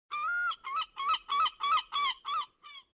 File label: seagull; Soldier Of Fortune II Double Helix; XBOX